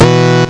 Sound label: Electric Shock; Short Circuit